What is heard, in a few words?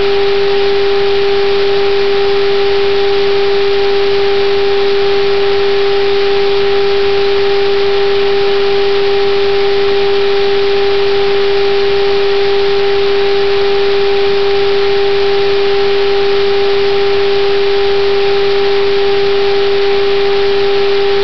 truck toy transmitter